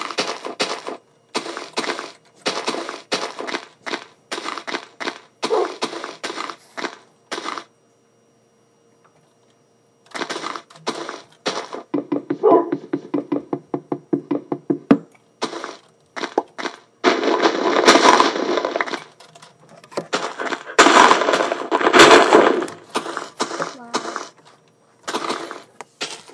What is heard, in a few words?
the sounds of minecraft